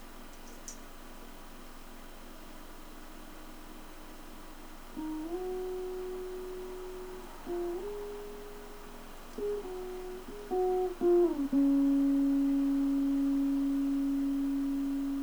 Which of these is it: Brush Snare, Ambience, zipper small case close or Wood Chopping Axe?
Ambience